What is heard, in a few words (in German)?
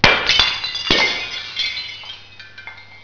glas